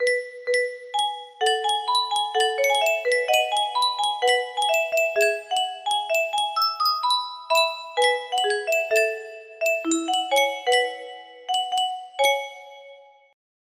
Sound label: music box, music box melody; demon slayer